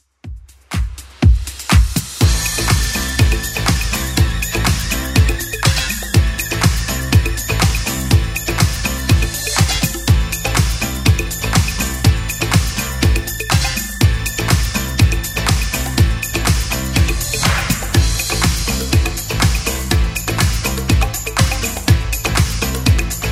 80s Club Edit 122bpm

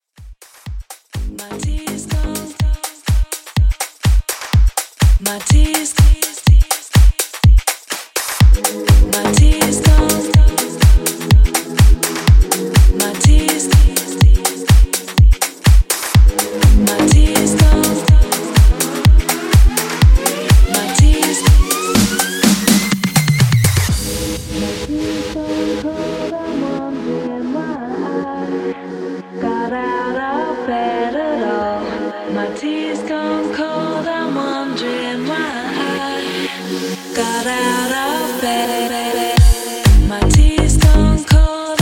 Mashup; Remixfreakz; remixplanet

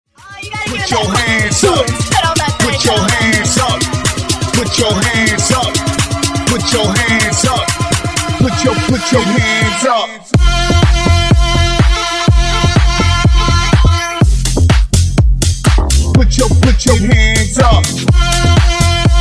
Funk Bootleg 124 Bpm

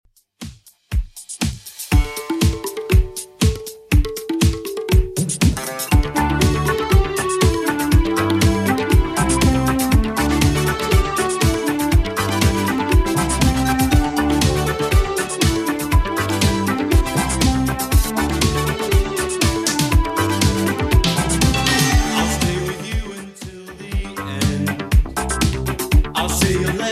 80s Redrum 120bpm

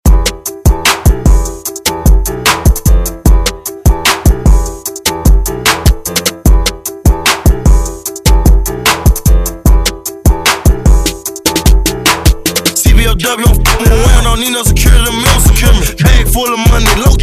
Hiphop Redrum

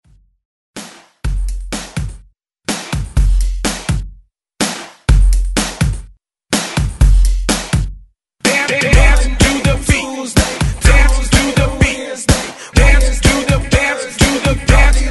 Hype ReDrum 125 Bpm; remixplanet

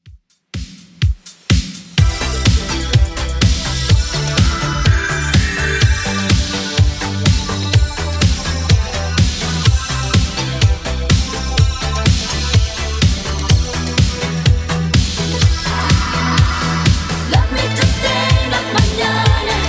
80s Redrum 125bpm

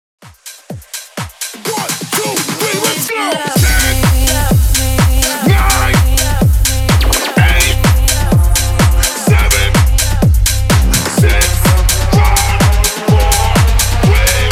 HOUSE MIX 126 BPM

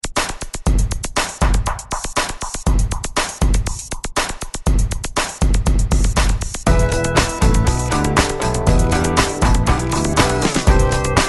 90s Re Drum 120 Bpm

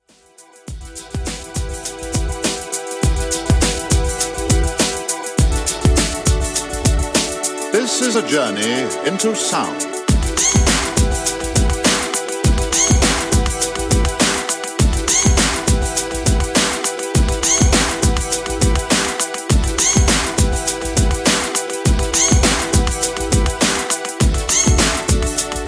Classic Redrum 102Bpm; djyan

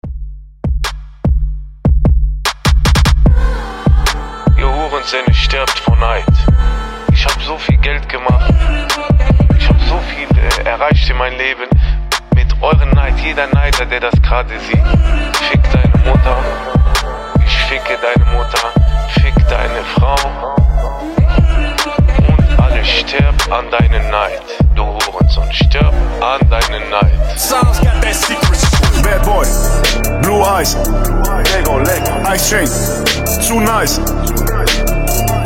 Trap

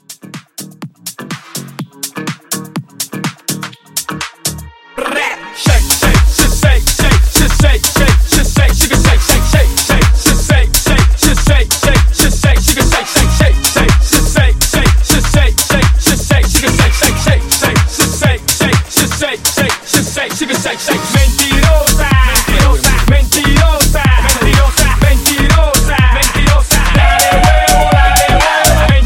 Latin Club Hype 124

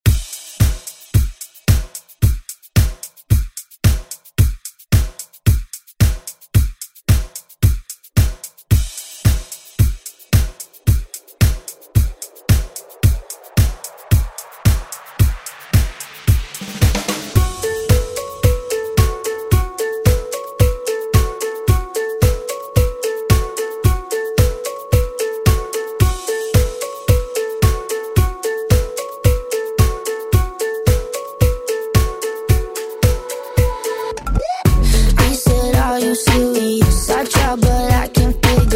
Pop Redrum; Deejay Hit

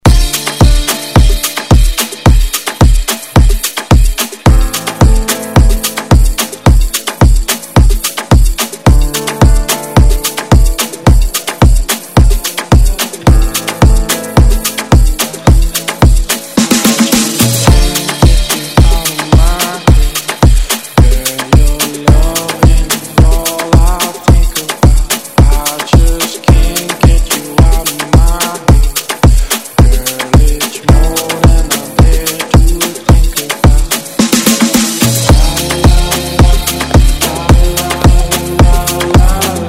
Moombahton Redrum

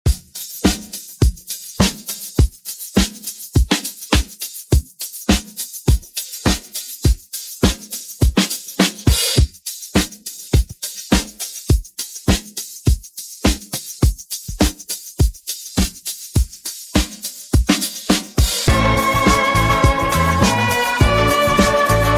Funk Intro Outro 103Bpm

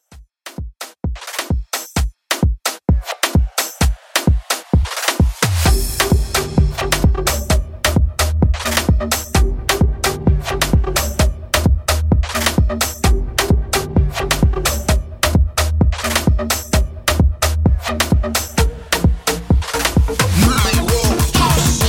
Urban Moombah 130 Bpm

